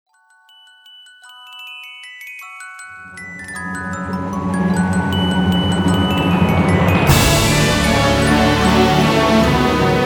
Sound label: Short Version; Seven Venus soundtrack